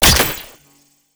Sniper Mech; Warframe; Sentient; Caliban Prime

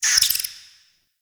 Interior Spider Chatter; Ambience; Warframe; Duviri; Murmur

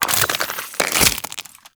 Infested Uzi Reload; Warframe

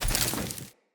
Walk Vegetation, Footsteps; Enemy; Characters; Lady Deora

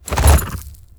Horse Jump Heavy; Vehicles; Foley Actions; Warframe; Duviri

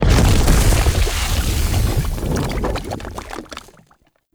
Lava Burst; Tau; Circles Of Hell

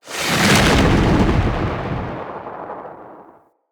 Lightning Blast Cast; Enemy; Abilities; Nimrod

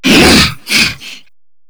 Grineer Hammer Boss Grunt D; Warframe

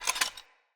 Foley Action Metallic Punch; Arm

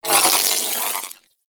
Ball Held Spark; Warframe; Missions; Tenno Ball

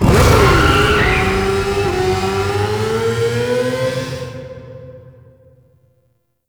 Enter Stinger; Rusalka; Convoy; Warframe; NineteenNinetyNine; Stage8ShitboxConvoy

